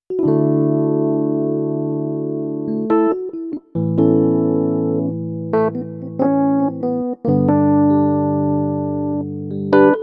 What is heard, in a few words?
EPiano; CLP470